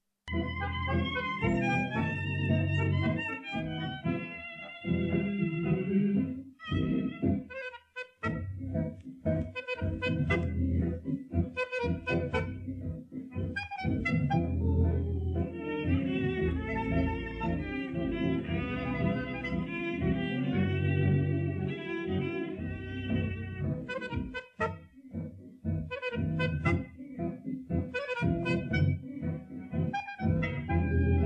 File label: Lviv tango Old Ukrainian tango